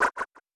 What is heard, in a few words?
Whip; Super Smash Bros